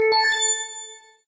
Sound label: nut capture